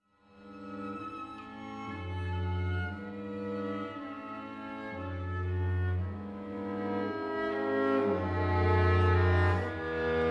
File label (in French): quatuor a cordes no 2 en la mineur op 13 adagio allegro vivace; felix mendelssohn